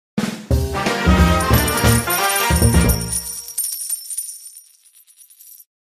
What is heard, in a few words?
jackpot